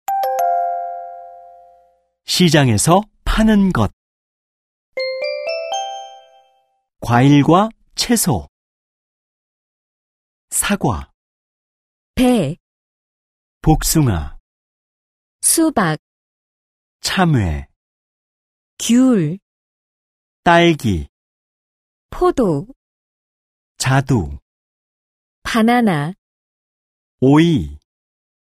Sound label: K-POP